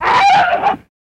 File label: Monster Wail Painful, bears